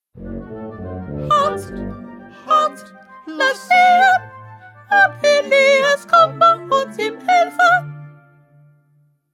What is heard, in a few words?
Soprano